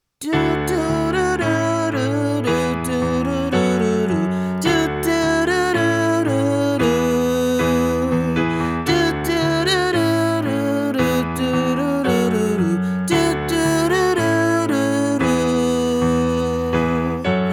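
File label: O What a Glorious Night Alto with Lead, christmas choir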